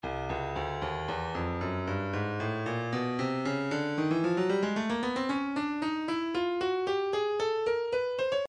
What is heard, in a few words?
breakbeat 057 bpm; Stylus Breakbeat Midi Files 050 059 Bpm; Isle B